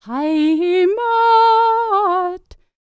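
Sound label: Scandinavian, World Voices; ILIO; Vocal Planet VOL-5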